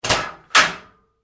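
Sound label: Door Locker Open Close Up Hard, Metal Door; Doors; BigRoomSound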